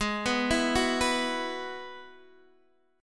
G sharp Minor 7th Sharp 5th, Guitar Open D; x,11,9,10,9,9

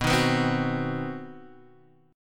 C Minor Major 7th Flat 5th B, chord

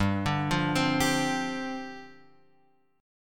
G 7th Suspended 4th G down Guitar Open F; G7sus4 arpeggiated

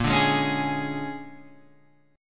A sharp 7th Sharp 9th A sharp down Guitar Open G

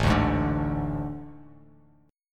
A Major 7th Suspended 4th; AM7sus4; strummed; JGuitar

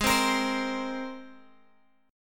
G sharp Major Add 9th G sharp, Guitar Open G

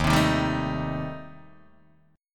Eb 6th Flat 5th Eb, Guitar DADGAD; 1,3,1,0,0,1; down

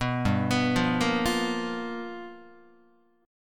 G 7th Sharp 9th G chord, Guitar

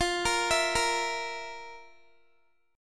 F Major 7th Suspended 4th, Guitar; arpeggiated

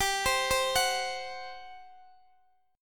G Major 7th Suspended 4th, Guitar, Open D; x,x,17,18,15,16; down

